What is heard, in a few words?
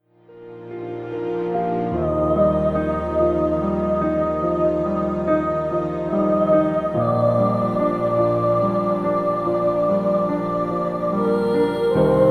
Instrumental